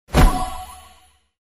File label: open Bag